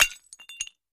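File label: Tile Large Square Piece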